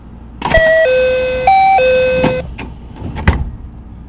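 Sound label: 207 door close; ２０７系１０００番台ドア開閉音; 録音：管理人; BVE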